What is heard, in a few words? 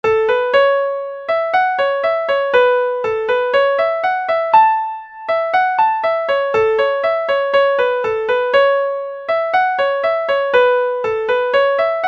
bass drone; folk music; midi; break yer bass drone